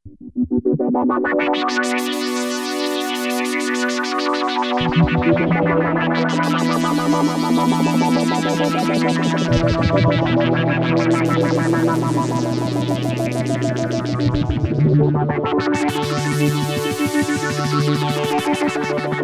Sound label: VCF Modulation